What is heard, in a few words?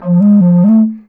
moc li, MOCENO BASSFLUTE LICKS; PS-52 WORLD INSTR 2; WOODWIND AND BRASS